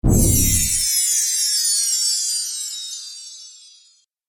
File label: Explosive Magic, magic sound effect; Magic Sound Effects